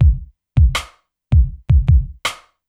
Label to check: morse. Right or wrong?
Wrong